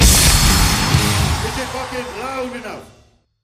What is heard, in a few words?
small Win Musical